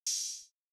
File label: Drum Loop, 92 BPM Open Hat; Loop Stems; Livid Drum Loop; Cymatics; Vibes II Drum Loop Collection